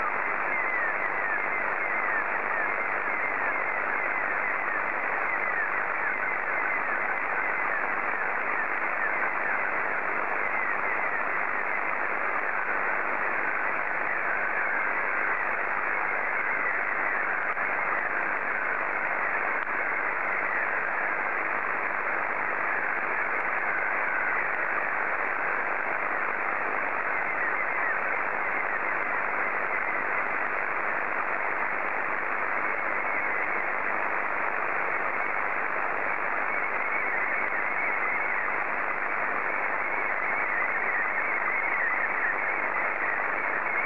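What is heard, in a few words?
Scottie 1, slow-scan television; JASOEN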